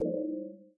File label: Error Remind; Game rip; MiSide